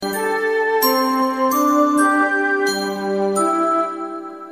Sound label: nice sms